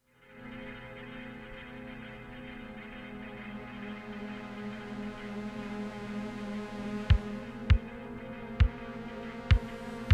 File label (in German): Ensemble Atem Chor